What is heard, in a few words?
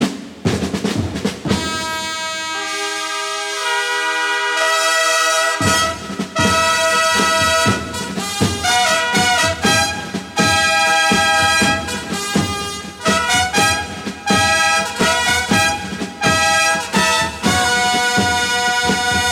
Soul Fanfare